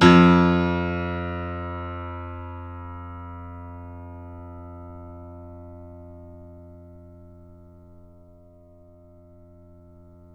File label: FF F 6, Grand Piano off mic; AKAI S6000 CD-ROM - Volume 2